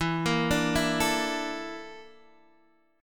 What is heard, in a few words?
Bb Major 7th Flat 5th